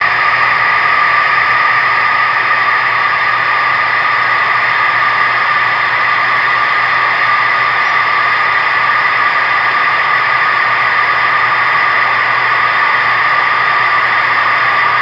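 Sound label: Background crowd noise, baseball pinball arcade game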